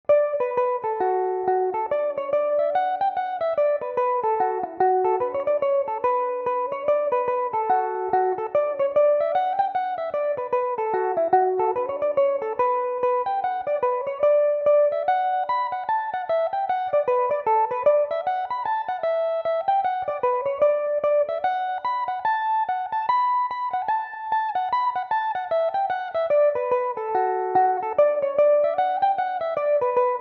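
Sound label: B minor, irish mandolin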